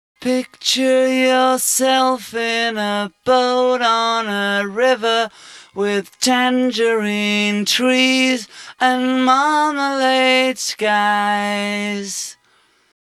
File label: vocals original speed